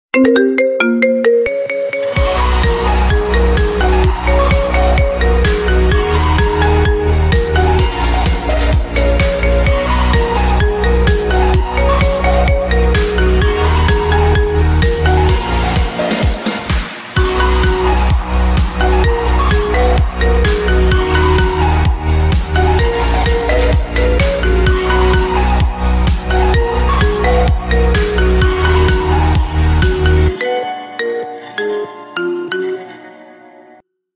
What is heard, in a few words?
marimba remix